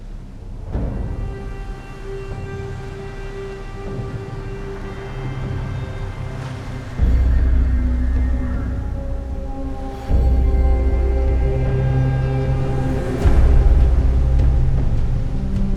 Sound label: vikings main game shorter loop; netent